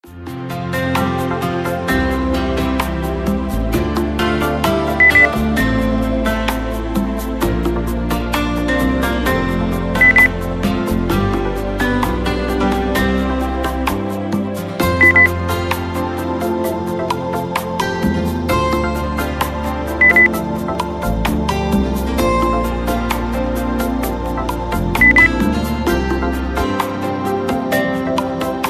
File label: soft beep